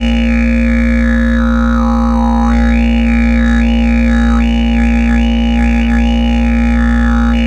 Didgeridoo, DRONE SIMPLE; Big Fish Audio; Didgeridoo and Other Primitive Instruments

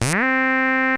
Synth hits and riffs

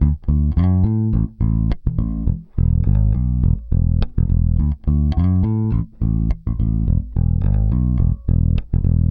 Pop, Bass

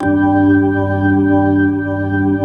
G2, Keyboards Old School; USB Soundscan vol.09; AKAI; BIG HAMM

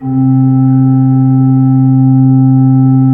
C2, Cathedral Organ, ROHRFLOETE; Propeller Island

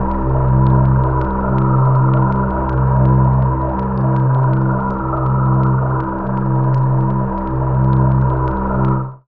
drone c, Alien & Drones (atmo); ambient; Chillout

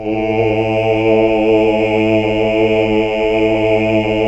Basses; Sound & Vision - Gigapack II CD 1 (Roland); HAL Greg. Basses

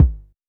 Polite kicks G1, Short; LIMAT Div Dept; Drums

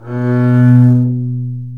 STR, Cb Harmonics; Roland L-CD702; Cb Bowed

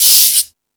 Percussion, Drum Machines E-MU Pro-Cussion

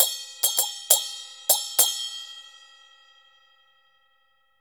CHNA SM15 R, Heart of Asia; Spectrasonics - Heart of Asia CD2

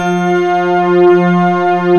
synth 2, Kawai; Rubber Chicken Software Sample Bank

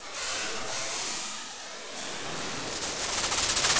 FX Scare, Drums & Drones

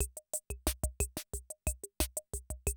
CR78, DrumLoops

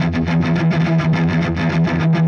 Chunk Guitar

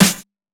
D&B Snare; neuro

